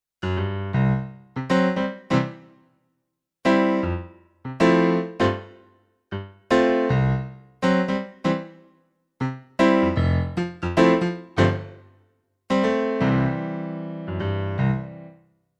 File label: Yamaha NP-35 Piano